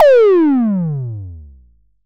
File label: Tom Fat; Drums